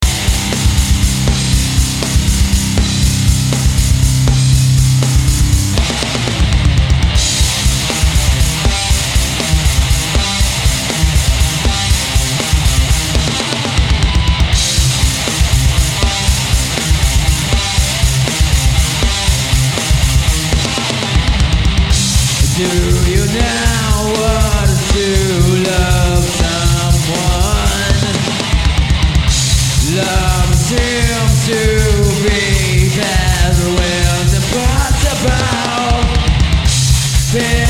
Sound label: Noise pain in the heart